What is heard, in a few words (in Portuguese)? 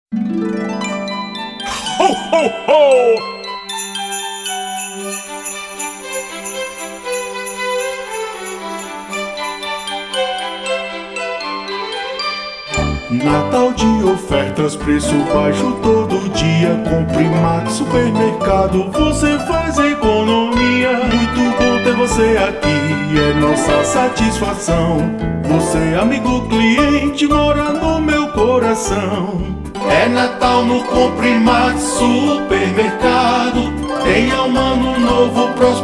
Supermercado Jingle; Compre Max